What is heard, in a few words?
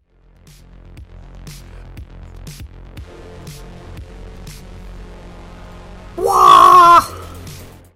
wahhh